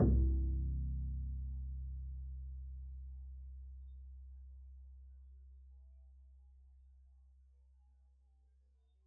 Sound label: pizz c1, double bass; dsmolken